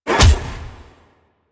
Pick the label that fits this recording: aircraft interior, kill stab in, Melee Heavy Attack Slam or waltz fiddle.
Melee Heavy Attack Slam